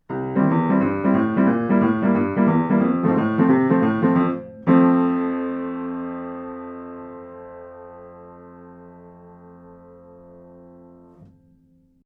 GA-251 OMNI Piano 2 Meters

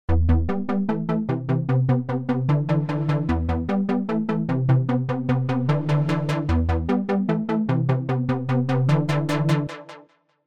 OBXa V sequenz automated

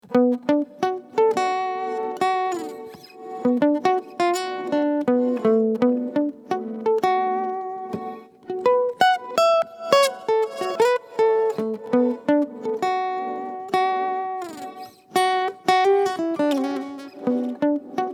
ME 3 Passenger Effekt; LAVA MUSIC

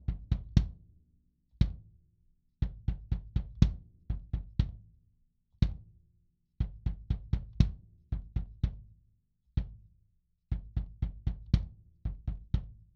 Presonus DM 7 Bass Drum

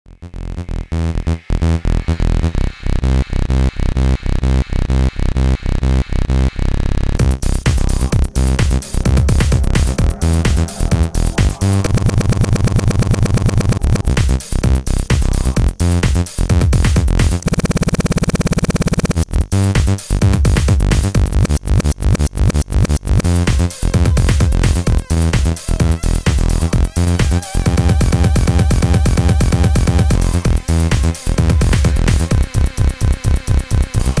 BeatMasher

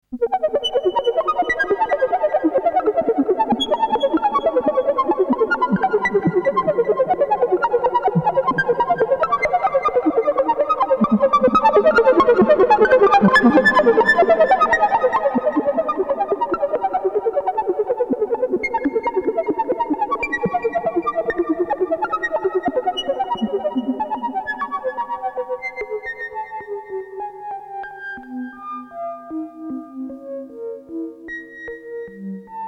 SH-1000 Sample Hold Delay Reverb

